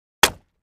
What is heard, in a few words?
Axe Wood Crate Palett